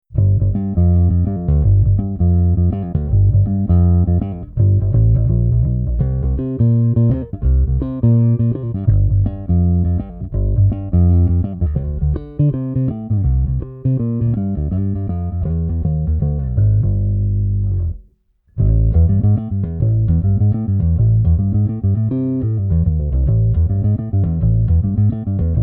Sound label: acoustic; amps